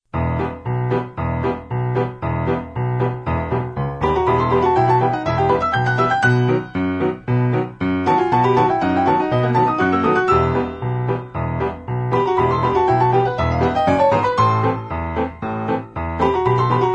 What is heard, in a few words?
piano duet